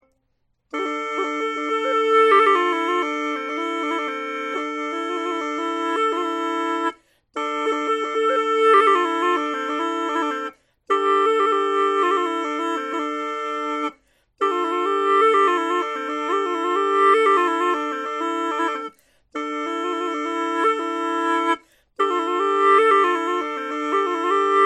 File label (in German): hulusi einfach tief